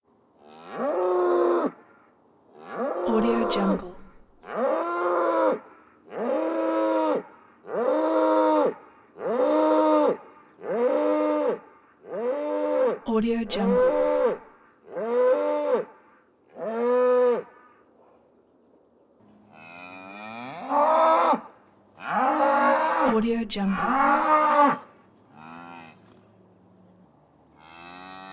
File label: steer bellows several mooooooooo agitated cows; prosoundfx